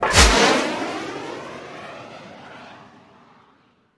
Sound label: turret aa launch